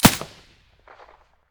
silencer; weapons; pp1901; vityaz

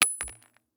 shell 39mm carpet; STALKER; IWP

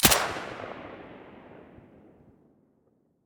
silencer stereo, weapons

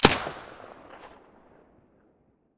mp5 silencer stereo, weapons

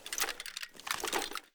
torch use; STALKER; IWP; item usage

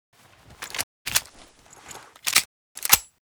pm reload empty, weapons

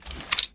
echo hide, detectors; STALKER; IWP

